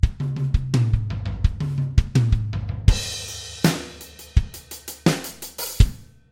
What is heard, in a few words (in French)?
Batterie